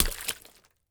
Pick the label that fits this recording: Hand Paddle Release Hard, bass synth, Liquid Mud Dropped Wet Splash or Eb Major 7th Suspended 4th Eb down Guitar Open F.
Liquid Mud Dropped Wet Splash